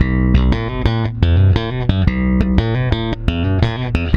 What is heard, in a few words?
C#, Bass; Spectrasonics; Bass Legends; 128 PWRSTRUM